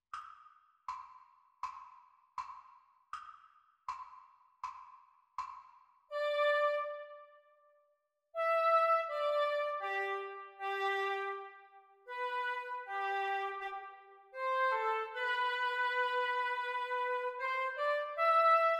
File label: oboe duets practice; practise speed